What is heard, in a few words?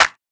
Latin Clap Pop